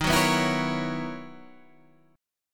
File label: B Major 11th D sharp, guitar